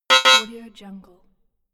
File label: wrong answer buzzer double; soundjay